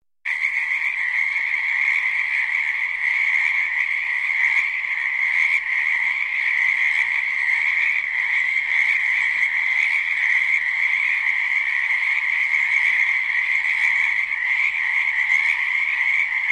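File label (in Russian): болото много лягушек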